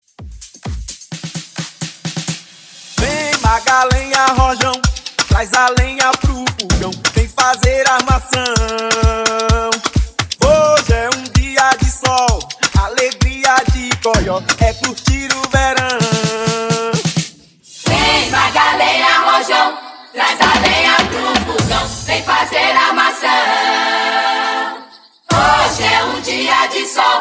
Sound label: Club Smash Up 129 BPM